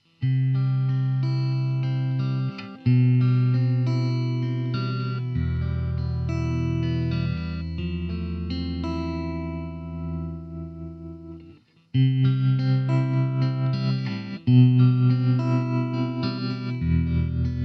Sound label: Vox Pathfinder